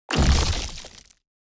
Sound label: successful build